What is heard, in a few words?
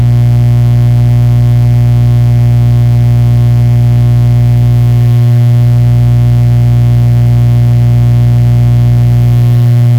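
continuous loopable, electrical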